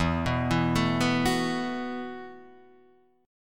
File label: E Suspended 2nd Suspended 4th E, Guitar, Open D; down